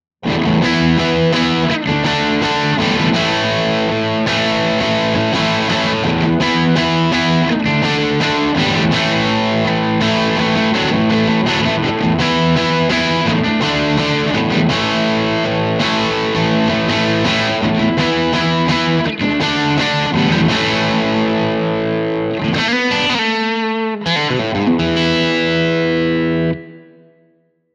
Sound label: crunch; jensen tone